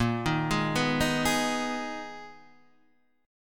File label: Ab Minor Bb, Abm/Bb chord; Abm/Bb arpeggiated; JGuitar